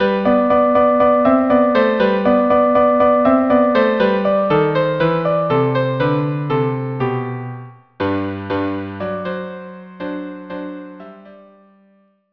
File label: Dayenu viola cello duo